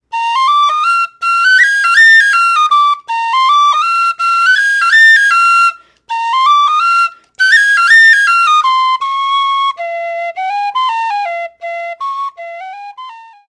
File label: Flute sample; Spring House